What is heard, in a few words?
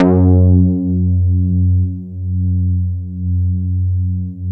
MATRIX 12, OB Synths